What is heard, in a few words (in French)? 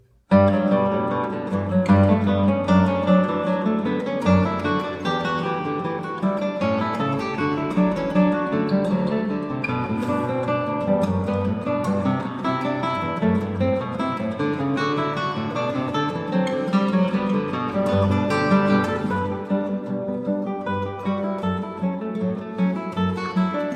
Variation Goldberg Guitare variations